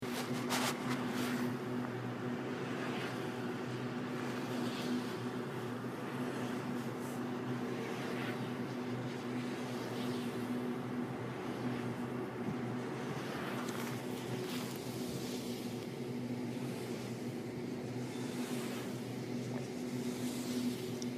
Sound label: Turbine